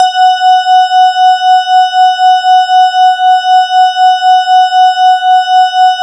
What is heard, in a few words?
E-HARP, DX HARP; Wizoo - Powered DX